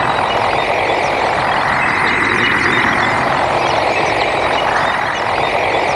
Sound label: NOISE BELLS; Zero-G Ambient 1; WNOISE ATMOS